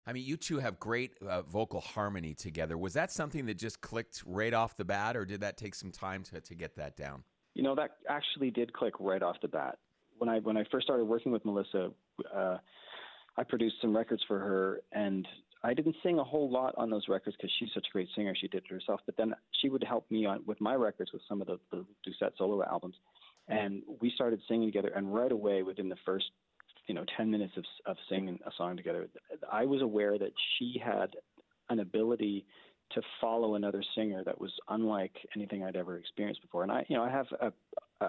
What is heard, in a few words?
harmonies; Whitehorse; North Bay